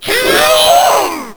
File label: Hyouuuugh